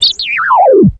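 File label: droid astro dead; Star Wars Knights Of The Old Republic I; XBOX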